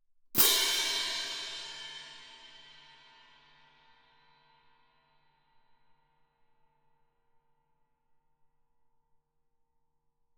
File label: 18 orch crash, viennese, ff, crash cymbals; Percussion